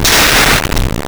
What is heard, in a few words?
Scala, Impulse Responses, Right Down; Ambiovolver